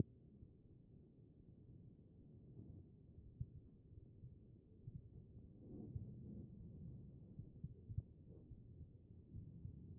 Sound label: HEART B MODE